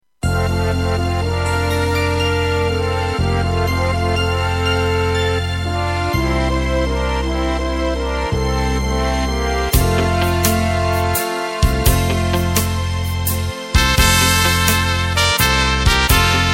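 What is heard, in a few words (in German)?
Der Königsjodler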